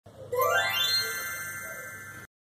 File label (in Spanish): respuesta correct